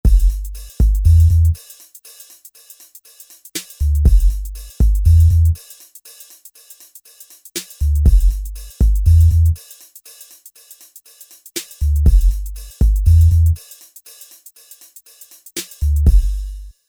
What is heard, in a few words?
Ambient